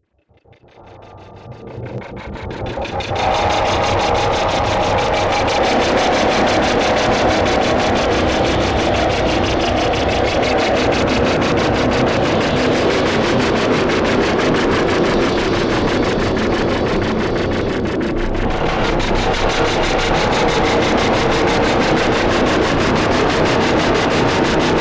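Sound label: Science fiction Horror Machinery Drone; Drone Sound Effects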